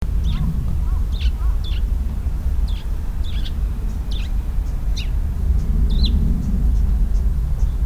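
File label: suzume, sparrow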